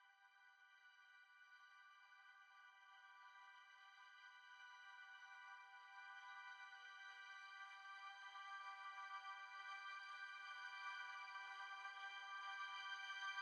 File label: foreground synth